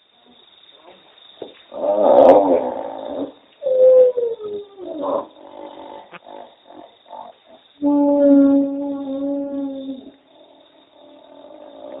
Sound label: Mystery Mammal